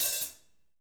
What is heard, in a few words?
snap; ID KITS; Sonic Reality; Interactive Drum Kits; 90s sample cds